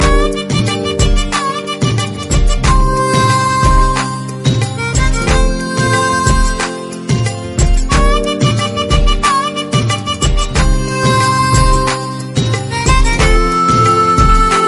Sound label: beautiful music